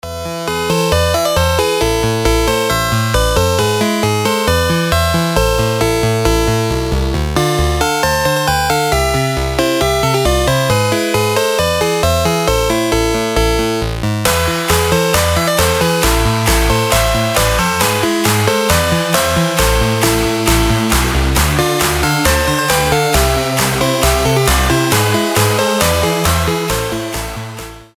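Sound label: bounce fun electronic music; iz mayka i kvant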